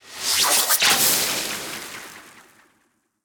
super mega win ramp up; arcane

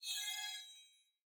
small Win Flash; cashomatic

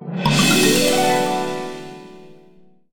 free Spin Win; wild turkey

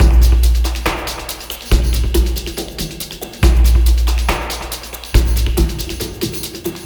respin Perc Loop 140; codex of fortune

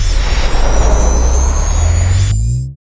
Near Win Main; godsoffortune

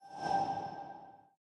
symbol Light Up; temple of nudges

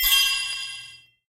multiplier Number Change; parthenon

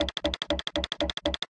wheel animation loop; jingle spin

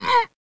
santa Pulls; jingle spin

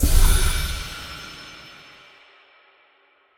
mega Text Change; wilderland

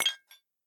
dice Coll Glass; jumanji

